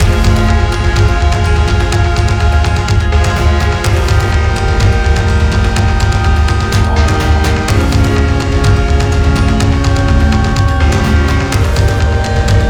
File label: Battle Loop; mad crown